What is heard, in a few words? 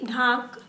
Kharia drum